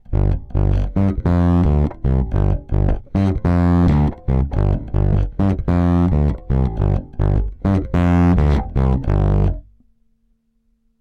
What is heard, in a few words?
Magic Pre EBass Color Overdrive; Fredenstein